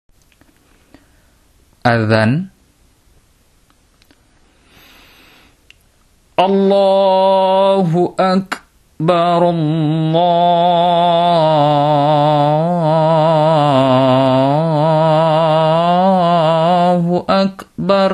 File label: Adzan